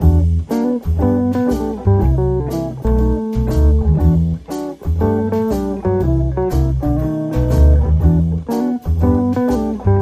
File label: Smooth No Piano